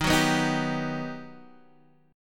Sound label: G Augmented Major 7th